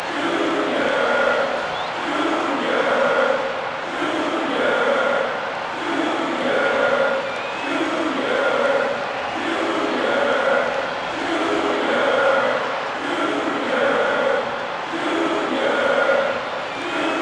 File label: Crowd Song; Bowser Jr.'s Crowd Song (US, EU); mario strikers charged gamerip